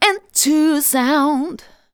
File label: vocals sung; Techno Trance Essentials